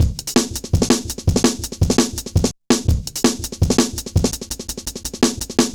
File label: Jazz Shuffle, Drum Loops; Jungle; 166.5; Zero-G Jungle Warfare 1, 2 & 3; 90 s Sample CDs